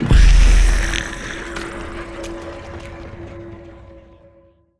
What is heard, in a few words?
lithoid spider; lithoid portrait; Stellaris